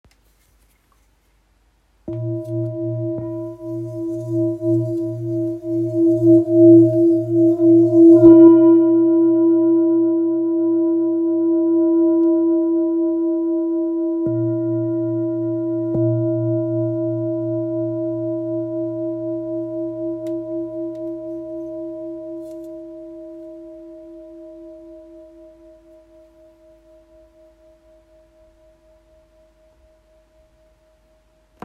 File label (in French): Bol therapeute La diese 900g